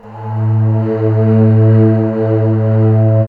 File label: BAMBOO; E-Lab; X Static Goldmine 1